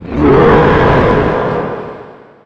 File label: blast; satan